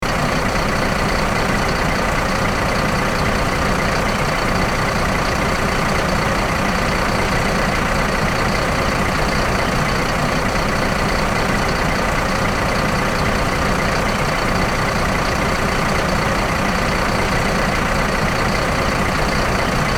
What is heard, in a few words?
Truck crane engine idle loop